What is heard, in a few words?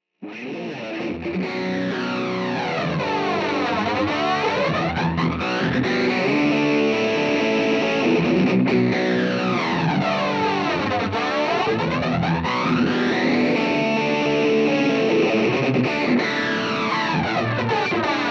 flanger